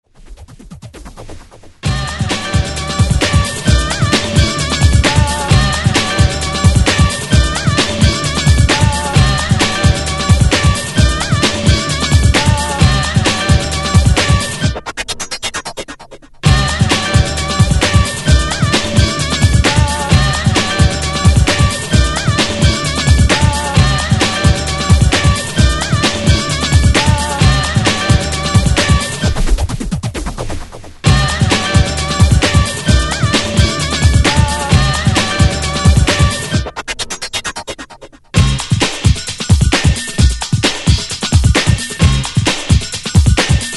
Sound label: KICK